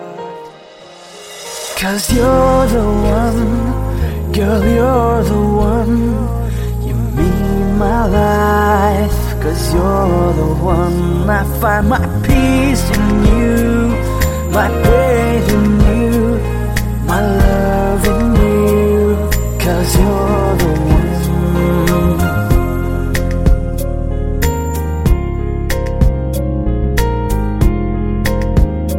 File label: English ringtone